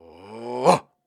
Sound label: Grunts and Chants, Viking Vocal